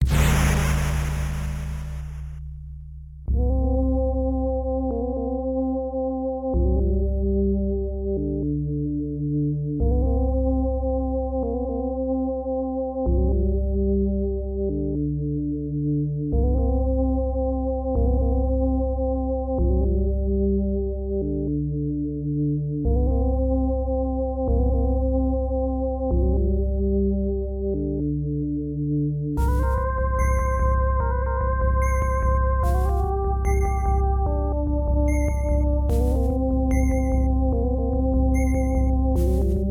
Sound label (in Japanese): 儀式 (FM音源版)